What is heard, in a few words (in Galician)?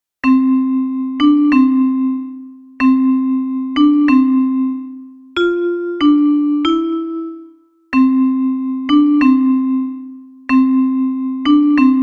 POMBINA DA PAZ Metalofono Contralto; UNHA PEZA PARA CADA FESTA